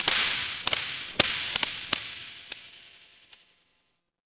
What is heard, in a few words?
fire electric spark